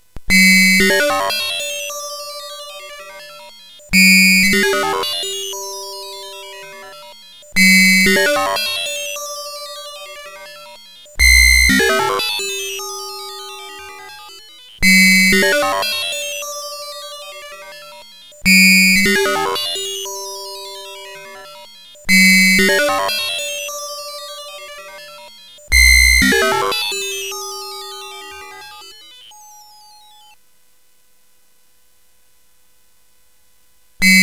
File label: sid CSG8580; Crystal; hvsc